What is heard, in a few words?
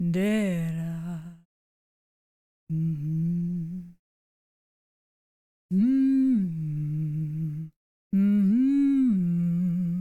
Female Song; Enemy; Female-Enemy-A-Song